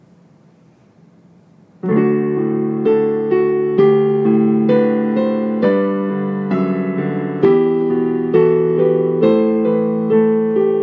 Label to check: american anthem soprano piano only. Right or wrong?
Right